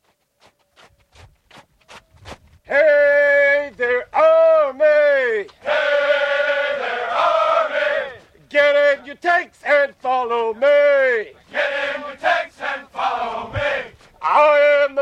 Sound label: Military Cadence USMC Infantry